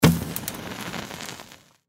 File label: torch Light; tiki-beats; eyecon